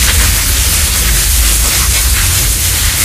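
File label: medi burn loop, weapons; tf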